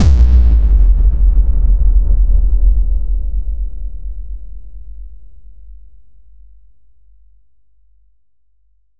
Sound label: Drop Hard Reverb, Sub Drops & Boom FXs